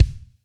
marimba drum; ゆめ2っき

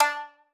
marimba shamisen; ゆめ2っき

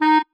marimba penguin; ゆめ2っき

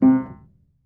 marimba piano; ゆめ2っき

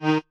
marimba chainsaw

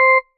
marimba tissue; ゆめ2っき

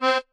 marimba chainsaw; ゆめ2っき

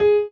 marimba; ゆめ2っき

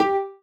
marimba rainbow; ゆめ2っき

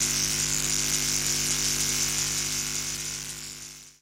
Electrical Current

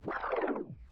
organic sfx

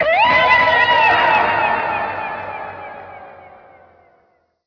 kyaaaa

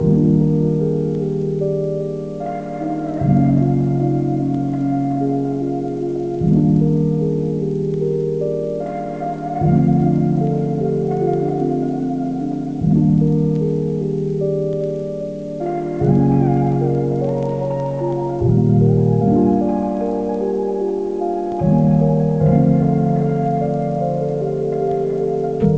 honeycomb lofi; ゆめ2っき

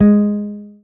marimba guitar

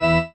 marimba grave; ゆめ2っき

